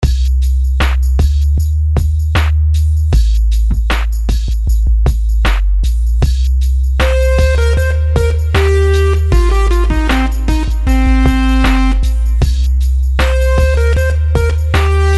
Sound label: melodies simple 16s Cmin; melody